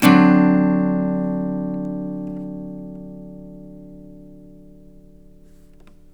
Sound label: CHORDS, Blues Guitar; ILIO; Fretworks